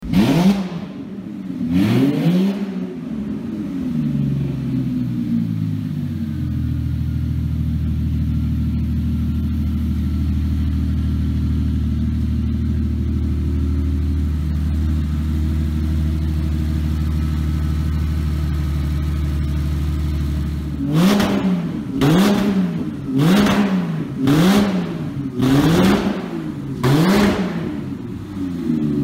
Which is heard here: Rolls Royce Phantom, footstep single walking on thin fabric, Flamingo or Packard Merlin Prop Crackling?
Rolls Royce Phantom